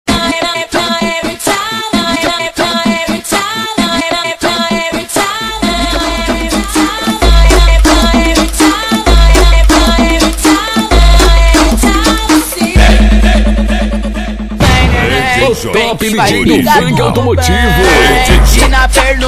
remix elecktro funk

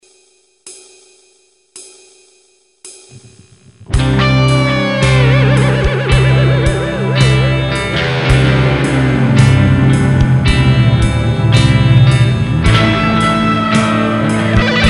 lick solo

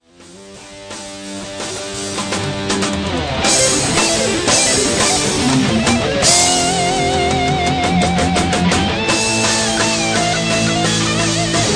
Groove Guitar solo

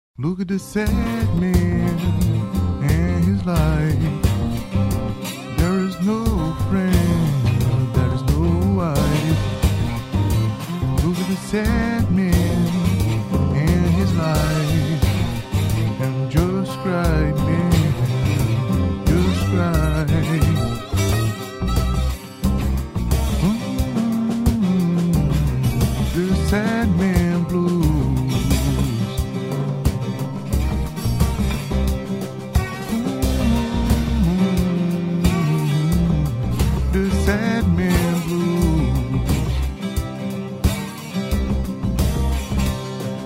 the sad man blues

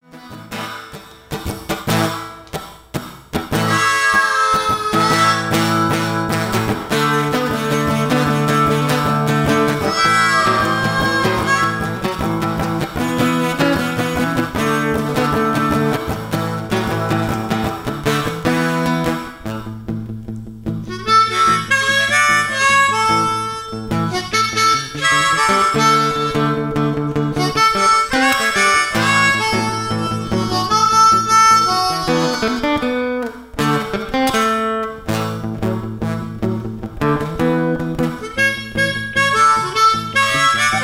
simple chords blues

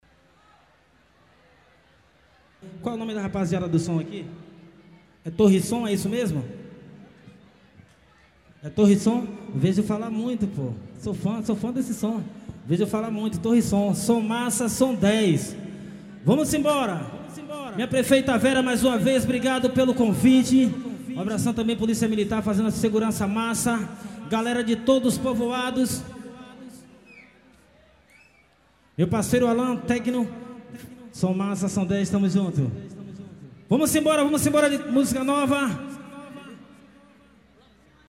reggae

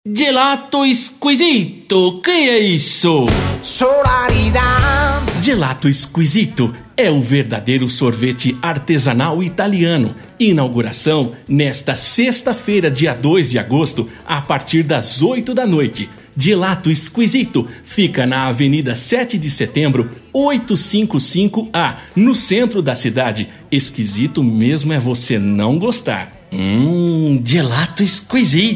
jingle

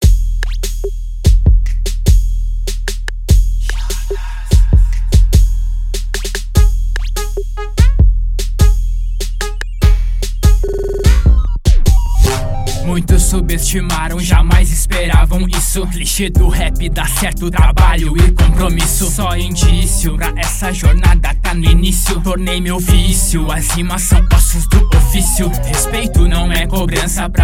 outro

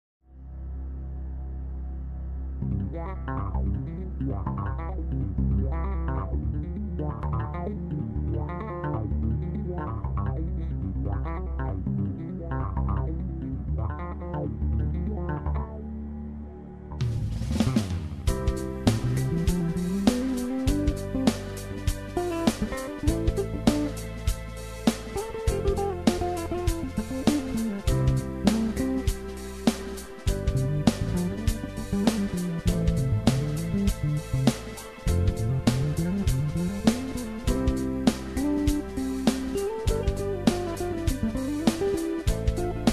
bass play bass

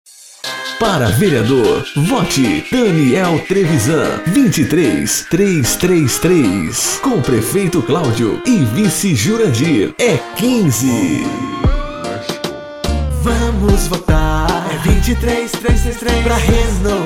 jingle

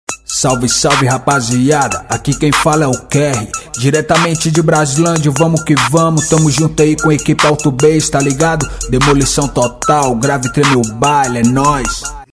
autobass intro

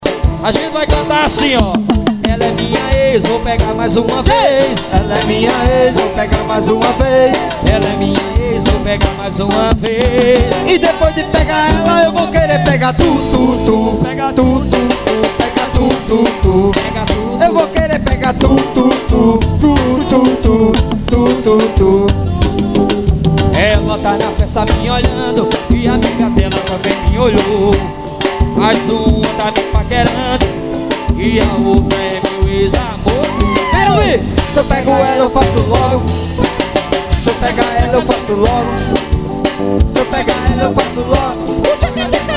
forro swing

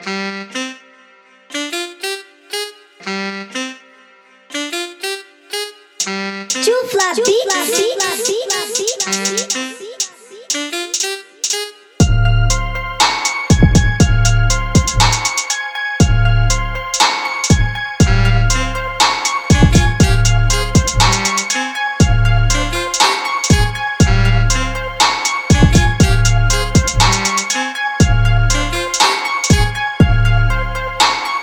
trap medium balanced

